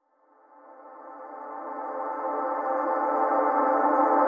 Reverse - D Min; FX; Reflect; Cymatics; GHOST (Beta Pack)

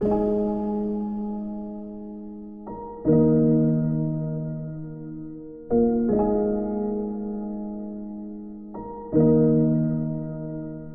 158 BPM D# Min Middle Keys; Loop Stems; Cymatics; Fill Up My Mind

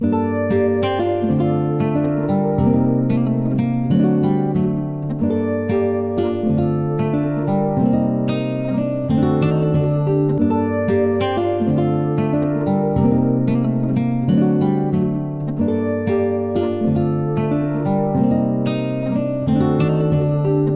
185 BPM G Min, Guitar; Lo Siento; Cymatics

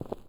Footstep Stone Medium

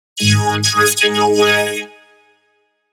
Vocal 140 (G#); One-Shots; Future Vocals; You're Drifting Away; Ghosthack; Color Bass Essentials

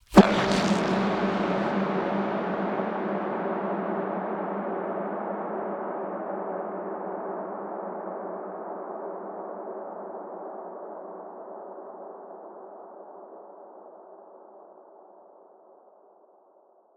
Impact

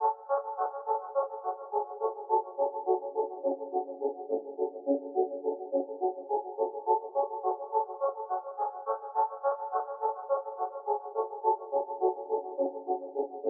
105 BPM F# Min Resampled; trap; Melodies; Loop Stems; Cymatics; Trap Starter Pack; Apollo

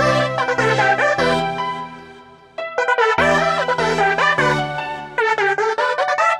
150 BPM E Min, Hip Hop Melodies Virtual; Space Party

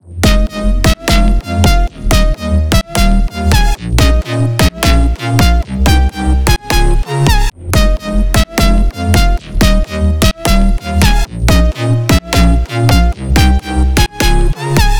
128 BPM F Min Sidechained; House; Drop Loops; Loop Stems; Cymatics; Sydney

